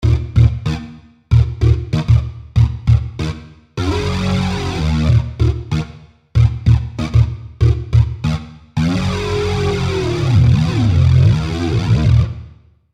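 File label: Prog Fuzz Bass, v-synth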